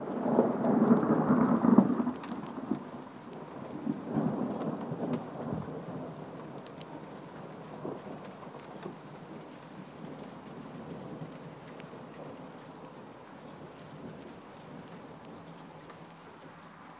thunder starts

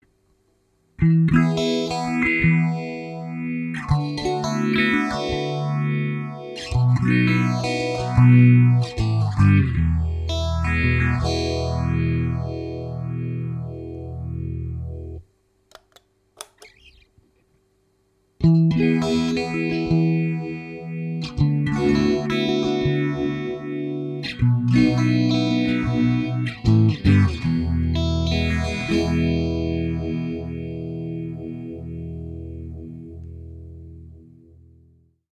morley phaser one and ibanez fp 777 test

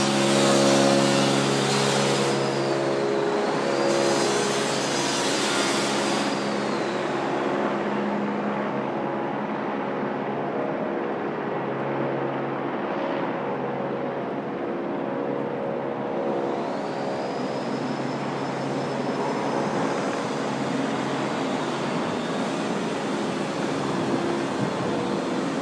helicopter; lord sugar drilling for the future

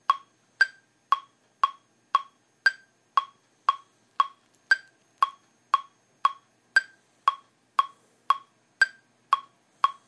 metronome

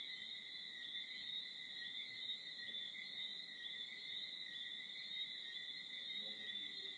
tree frogs bermuda

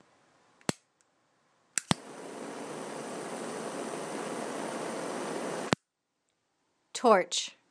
torch; sound semiotics of the kitchen

